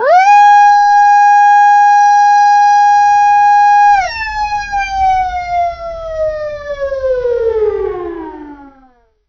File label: the pleasant howl of a, siren